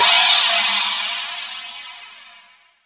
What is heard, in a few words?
MIX CYM, FX CYMBAL